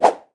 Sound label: stick roll back; vortex